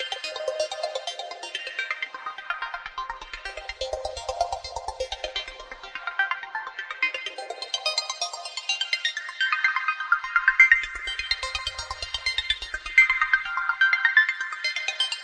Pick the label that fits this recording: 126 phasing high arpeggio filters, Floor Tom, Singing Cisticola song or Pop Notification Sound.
126 phasing high arpeggio filters